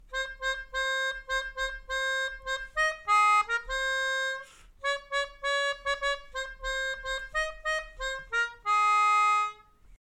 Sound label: Melodica